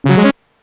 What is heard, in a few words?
future exclamation, computer sounds